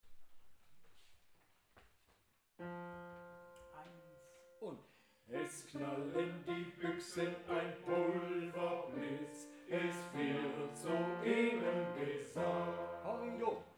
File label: bass